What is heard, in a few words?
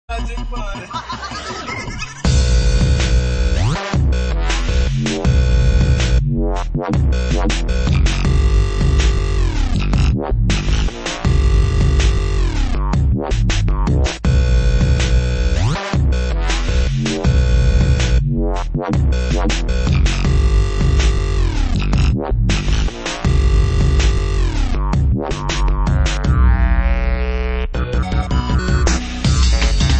Drumstep; Cauldron